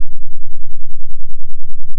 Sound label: fm voice single2; itakura saito; FFTimbre